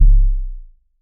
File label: fast minimal triple zoom; monochrome